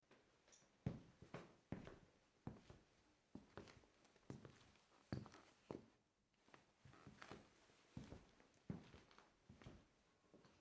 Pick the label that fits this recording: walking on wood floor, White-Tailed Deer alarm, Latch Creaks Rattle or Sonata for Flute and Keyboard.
walking on wood floor